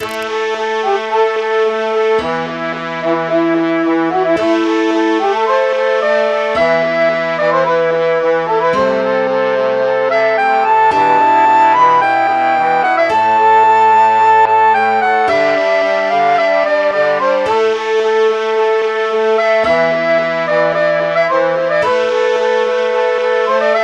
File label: MIDI